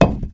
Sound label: concrete impact hard; Source Engine; video game; physics